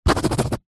office pen rollerball sign siganture on card

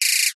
multimedia laser weapon fire

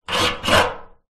metal rusty pole scrape on metal oil drum; foley

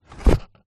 foley pizza box close; zapsplat

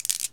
Gems Few; little robot sound factory

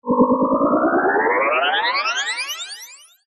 cartoon grow

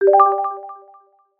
app alert tone

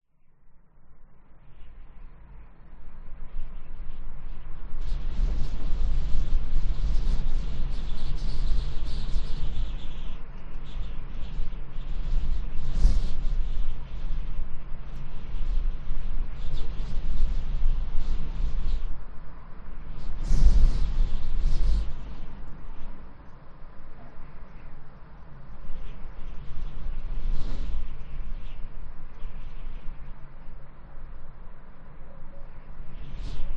City Wind Blowing Interior; west wolf

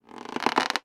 foley balloon rubbing squeak; sound effects one

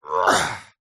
human male voice grunt groan lift heavy weight

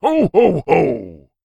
human male laugh ho ho ho santa clause; zapsplat

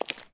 fantasy Footstep Dirt; little robot sound factory

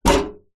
impact metal large loose hit light

